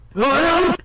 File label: correct